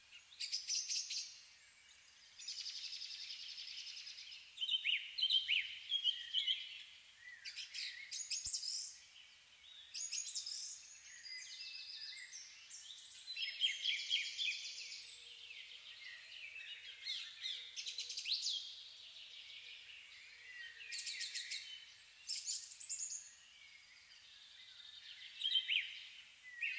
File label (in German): Field Recording Fruehling im Wald